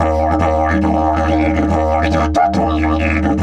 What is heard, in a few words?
EF 140, DIDGE RIFFS